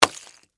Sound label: Impact Ice Block, Hammer; Miscellaneous Impact; BlastWave